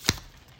throw down, cards; aliens

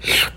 eat

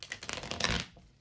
shuffle, cards; aliens

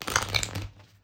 shuffle, cards; aliens

